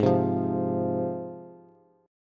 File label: Ab 6th Ab, Bass; strummed; JGuitar